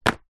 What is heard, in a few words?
cigarette packet down on table